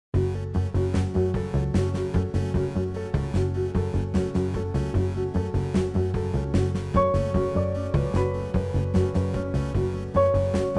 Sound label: String Test; HydraSynth